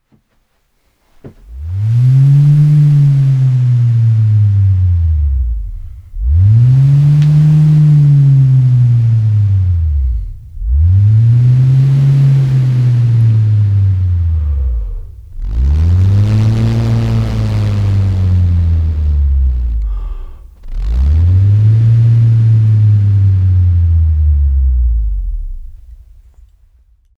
Porotiti; Te Ao o Taonga Puoro

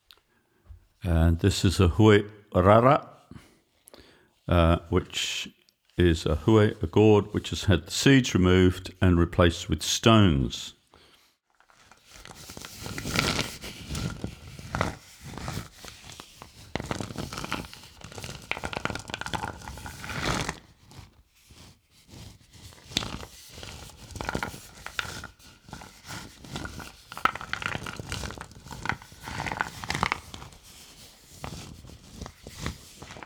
Hue Rara

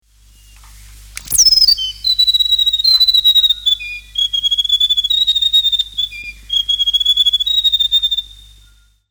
Riroriro; Te Ao o Taonga Puoro

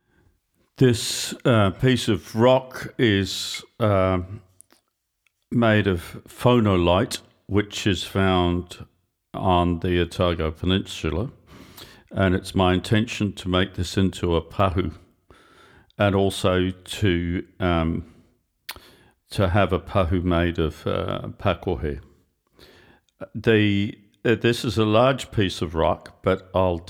Pahu Phonolite

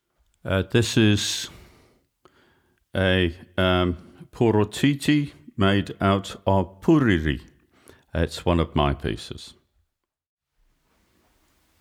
Porotiti

